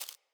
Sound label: Rat Step, Foley